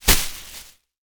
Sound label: plastic bag drop